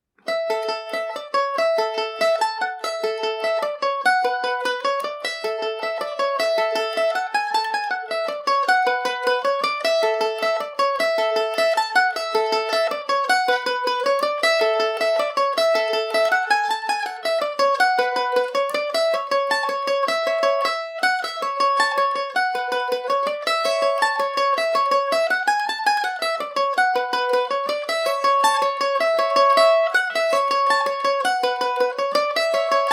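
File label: A major, irish mandolin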